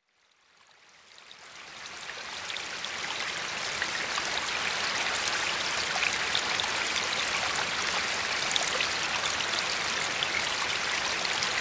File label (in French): riviere